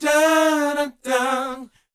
BOYZ A, Vocal Planet R&B & Dance; ILIO

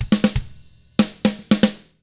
116 ROCK, Double Platinum Drums; ILIO

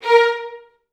A#3, Advanced Orchestra; Best Service

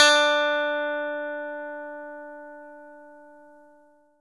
E-GITARRE, Alpine Volksmusik; Best Service

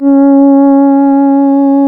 C#, Synclav FM, WIND; Big Fish Audio

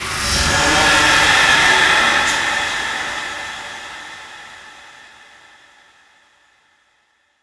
SCI-FI FX

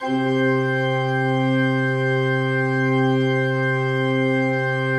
C2, Cathedral Organ; ILIO; Propeller Islands Cathedral Organ

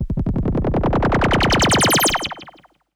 Classic FX; radiosonics; Classic Simple OSC FX

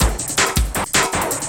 LOOP L, Drum & Bass 160 BPM

